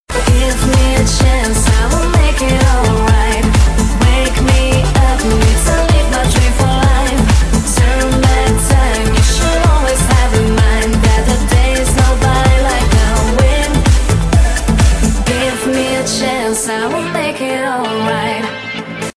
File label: Pink Noisy; Mestral